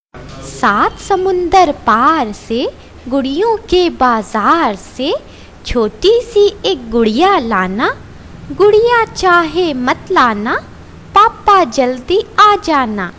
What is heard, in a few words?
child rhyems